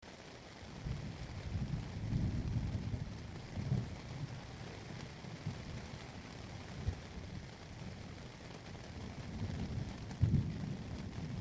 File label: thunderstorm